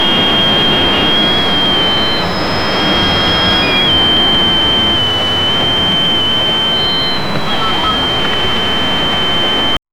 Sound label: waves birds wind tuning, radio tuning